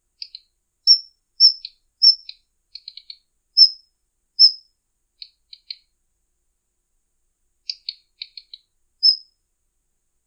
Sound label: daurian redstart